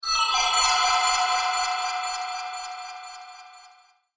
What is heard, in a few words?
Magic Spell; Magic Sound Effects; fantasy sound effect; Andrew Fleming